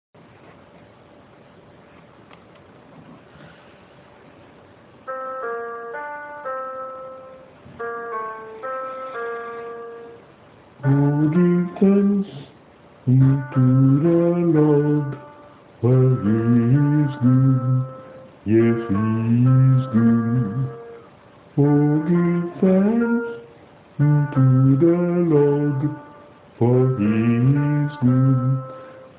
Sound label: bass; ohgivethx